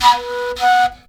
FLUTE LIN; Best Service; Ethnic Journey Sound Cube

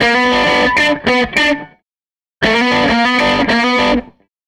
SG Guitar Loop; Sonic Foundry (Sony Creative Software); Six-String Orchestra

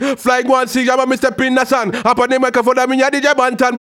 HAL RAGGAM, RAGGA-MUFF A maj

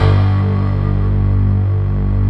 D50 Stak, Roland

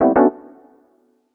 D&B Keys; neuro

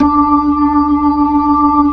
B-3 Jazz D5; Keyboards of The 60's and 70's

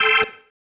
Stab, Organ, One Shots

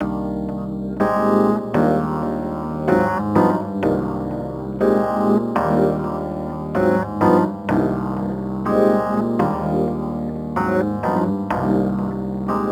Rhodes Attacks; Ueberschall

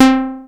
pentan C5, pentan bass; Best Service Dream Experience

